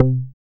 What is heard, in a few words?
BAS MKS-50, Roland